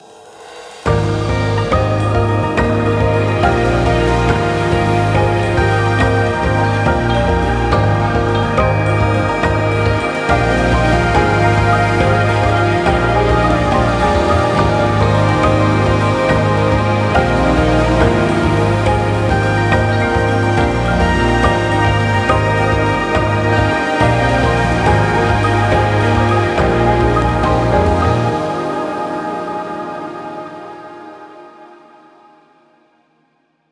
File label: Song Timestream